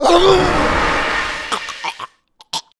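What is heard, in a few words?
Templar die; Kara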